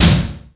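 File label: processed tom, drums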